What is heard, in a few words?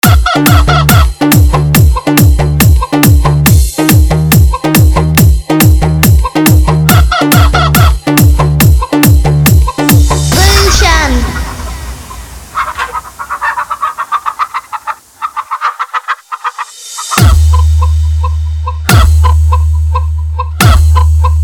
Deshi Dance